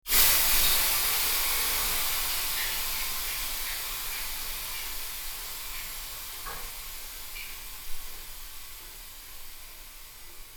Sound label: sauna slam